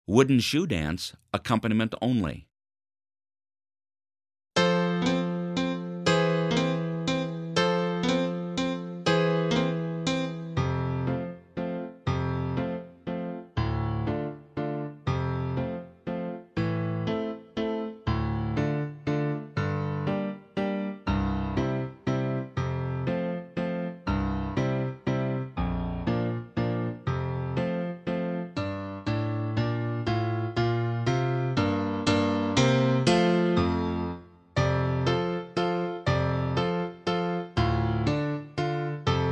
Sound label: fhorn wooden shoe dance piano only